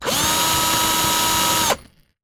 Drill

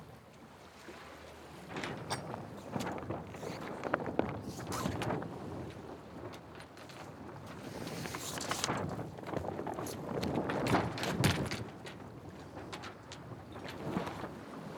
Sailing

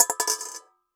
Coin On Collander; Coins

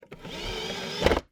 Insert, DVD Drive Internal; DVD Drives

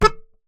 Melodica Short Note

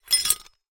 Hole Saw Rattle, Hole Saw Bits

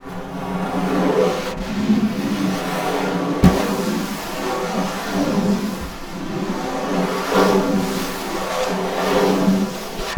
Snare Drum; Percussion Samples

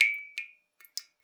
Drips

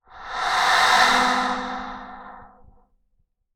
Wind, Melodica

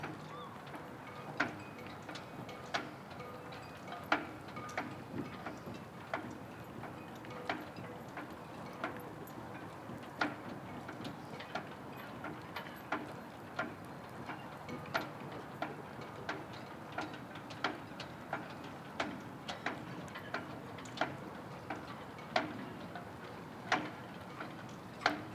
Sailing loopable

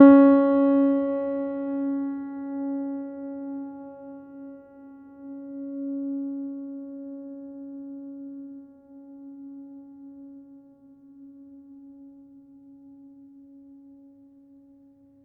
Note Ped, Piano; Piano Miscellaneous

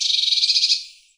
Birdsong Bit, Oxford Island Lough Neagh

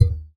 Classical Guitar Extended Technique

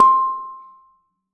Bonang P, Gamelan

